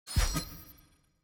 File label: environment mob level up